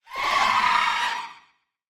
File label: Giggling Minister Roar